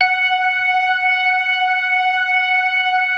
DRAWBAR VIB, Synth and Organ; InVision Interactive; Keith Emerson; 4DRAWBAR+VIB

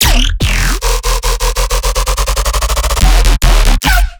Bass Stabs

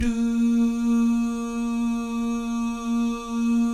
DOO GUYS, Vocal Jazz FX

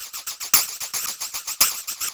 TAMB; Zero-G; Chemical Beats

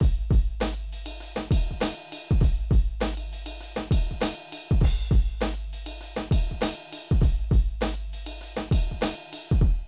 Drum loops, Hip-hop

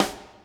SNR, DRUM ZONE; Masterbits - Total Zone

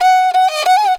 riti grooves; Best Service; World Colours

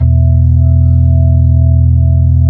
B-3, Brite

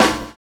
60 S BEAT; Univers Sons - Kitch 70s

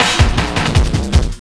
LOOP L, Drum & Bass 160 BPM

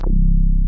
HOUSE BASS; Masterbits; Dance Trax

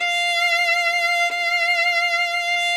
VLN F4; Sonic Reality; American Heartland

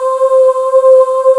VOICES, KORG O1/W; Rubber Chicken Software Sample Bank

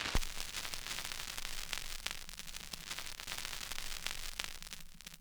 Crackle, Vinyl Noise

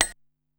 Noise Vibe, Korg Wavestation-SR Drum Kit

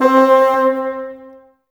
Bassoons C 4; Miroslav Vitous - Woodwind Brass Ensemble

